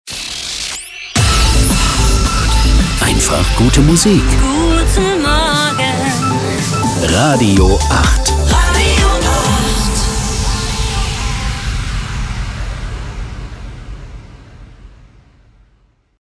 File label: Transition Med 2 fast Synth, "Guten Morgen"; RADIO 8